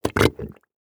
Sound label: mud fart bubble